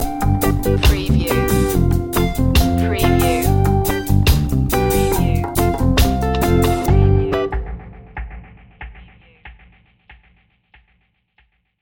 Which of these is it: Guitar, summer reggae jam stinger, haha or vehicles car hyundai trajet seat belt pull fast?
summer reggae jam stinger